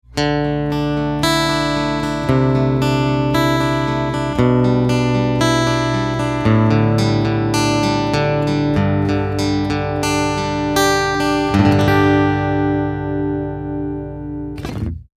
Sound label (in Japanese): ピック アルペジオ